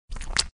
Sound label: Suck; Lewd SFX